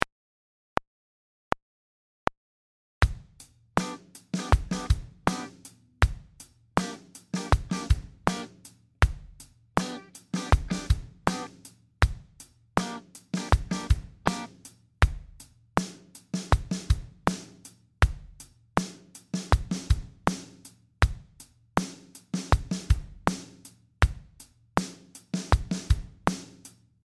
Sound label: groove r&b